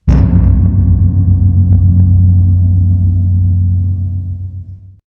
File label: Gong